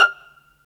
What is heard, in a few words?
STR PIZ VLN, Roland String Master Series; Vlns Pizz; Vls Pizz